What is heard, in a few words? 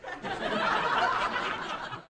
laughing; Gizmo Project 4.0.4; sound emoticons